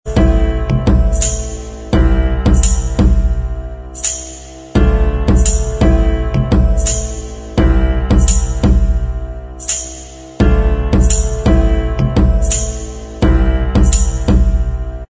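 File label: Instrumental Track, backing tracks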